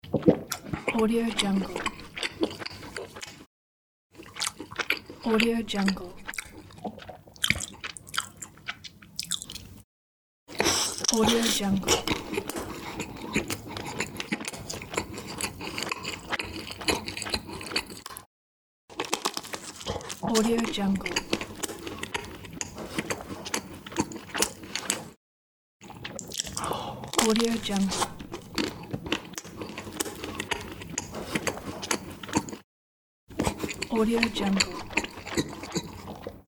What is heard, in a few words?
human eating sound effects